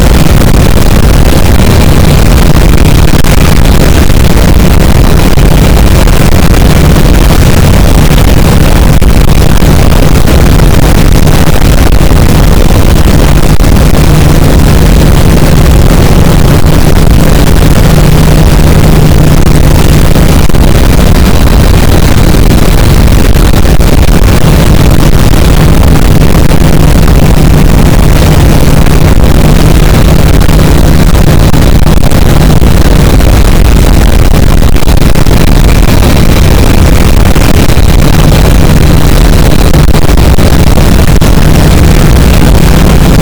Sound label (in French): cachalot negatif, boat, Physeter Macrocephalus